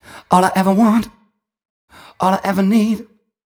m 128 mel b-flat, Vocals

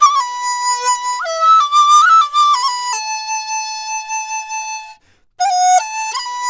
DIZU 80 D; INIS - Opium

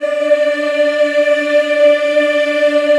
D4, Choir; USB Soundscan vol.28; Acoustic & Synth; AKAI

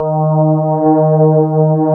electric pianos; Wizoo Electric Pianos

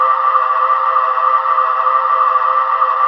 METAL DRONE; Zero-G Ambient 1; BREATHY PADS

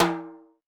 Mid Timbale, Alesis D4

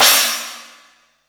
crash R; Zero-G; Chemical Beats; CRASH ZOO

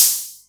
Roland MC09 Hat O

